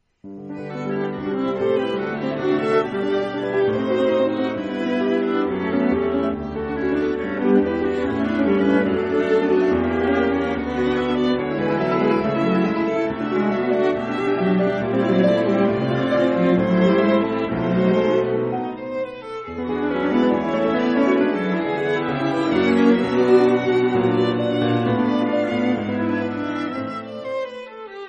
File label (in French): Quatuor piano